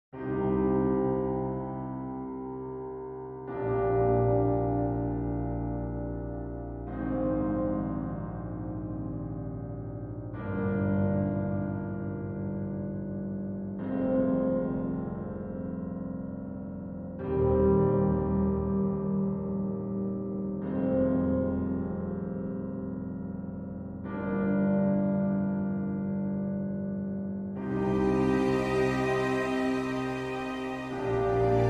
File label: Falling into Darkness